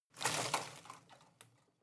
home plant leaves rustle heavy short

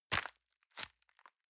envelope grab release hard

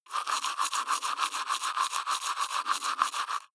teeth brush wet open molars

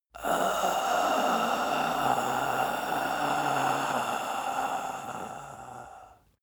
breath male exhale die

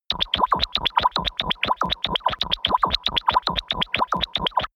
biomedical stasis pod

